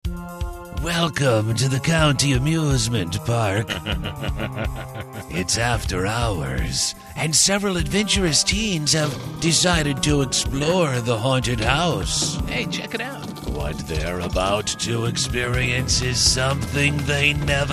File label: aahh